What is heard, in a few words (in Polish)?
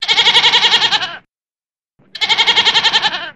Odgłosy Zwierząt Koza